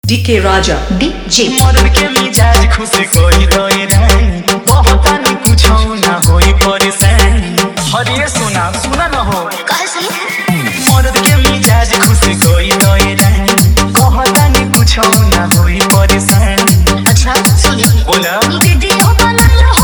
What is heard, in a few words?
Bhojpuri Remix Song